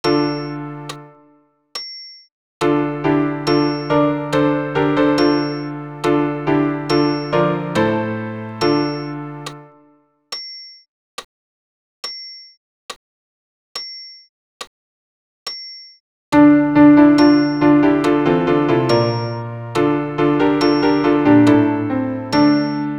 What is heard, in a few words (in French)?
Gloria Amen tous pian